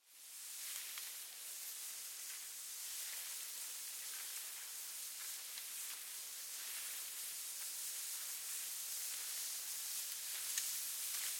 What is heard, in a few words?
Leaves Tall Grass Wind Moves Rustles; Weather; BigRoomSound